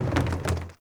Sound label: Pylon Plastic Roll; Warframe; Ambience; Nineteen Ninety Nine